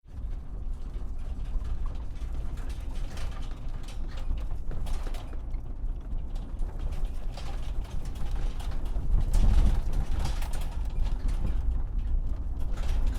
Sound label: truck bumps